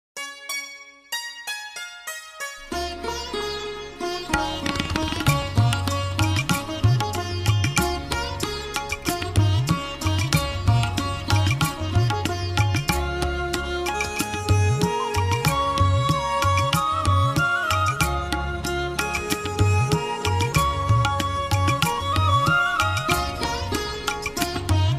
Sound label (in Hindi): उदबधन मयजक Udbodhan Music; ARYA VEER DAL MUSIC